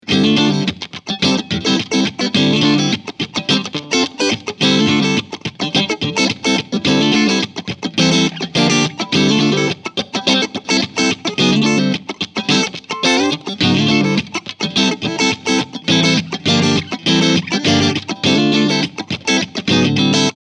Clean Funky

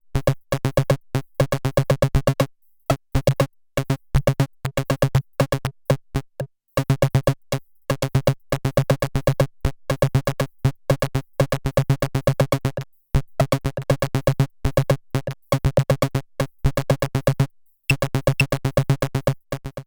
randomRhythm Stacked CV Sequence Variation

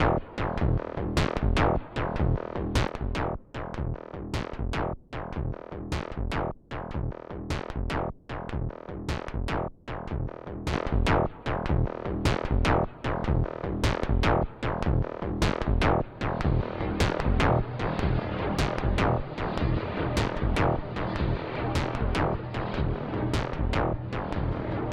Moog DFAM EHX Oceans 12

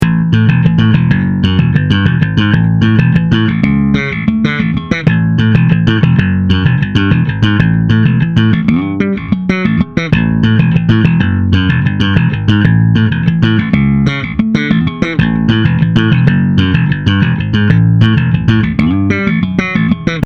Rock Slap

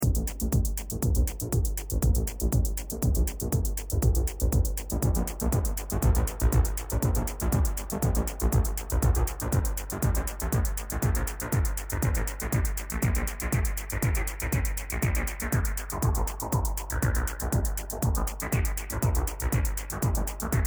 TD-3 Pattern wet

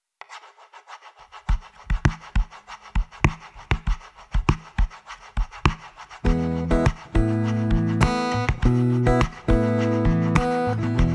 Fender Acoustasonic Jazzmaster

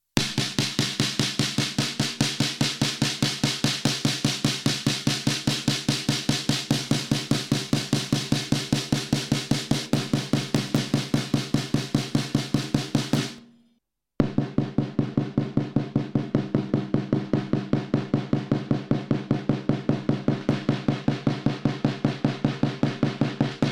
Channel Snare 73EQ JR Hi; Heritage Audio

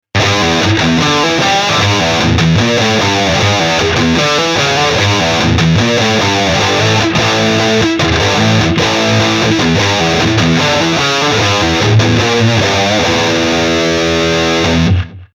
Eleven Rack DC Modern

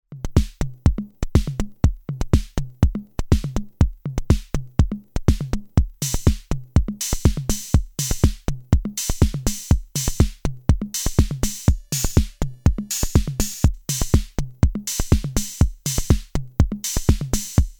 Bend

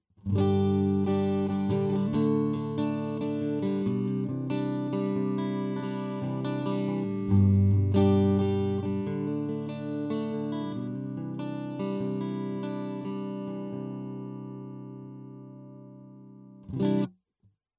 DUAL CUBE Acoustic Sim Dry

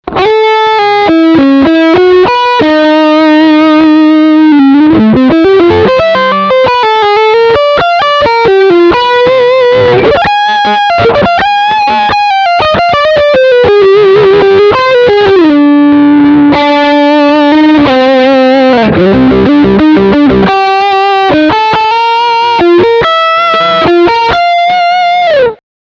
Lead Line

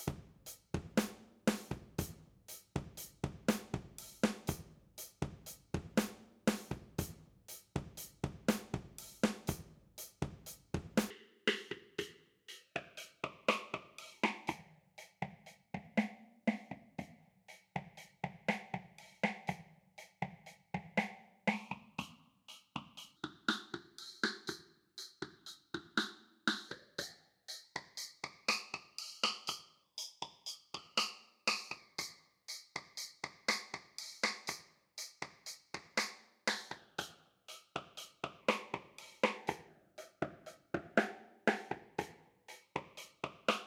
70s Vowel

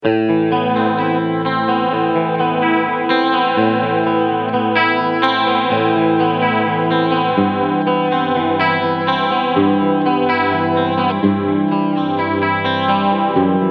Morley AFX-1 Chorus

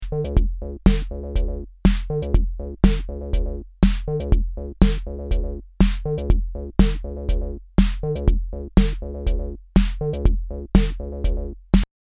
AMS Neve 8801 Line In; Rhythm Wolf

